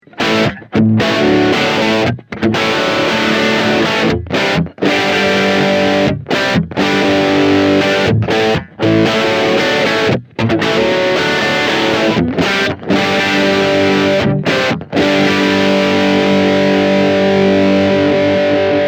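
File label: Bridge Channel 1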